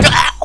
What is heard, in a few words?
fall; quake2; potator